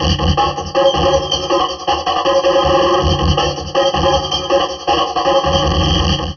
Drum Loops Crazy Processed

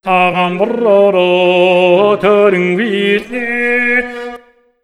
MONGOL V, Asian Traditions; USB Soundscan vol.60; AKAI

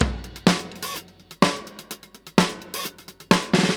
Funky 16 127, Beat Loops; Zero-G; Monster Beats

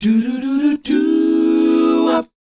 DOWOP F#, Vocal R&B DOO WOP; ILIO; Vocal Planet

